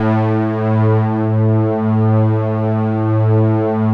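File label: OBERHM OBXA, Oberheim OB-Xa; Big Fish Audio - First Call Vintage Keyboards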